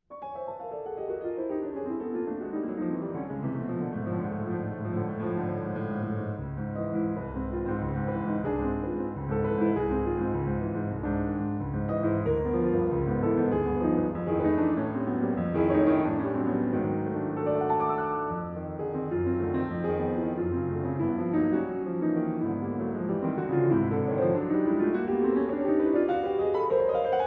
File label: Sonatina For pianoforte, Allegro, Lento, Marcia; Tranchell